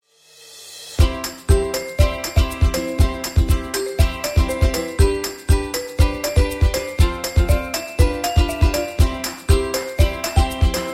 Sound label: Kids Mood Happy Dance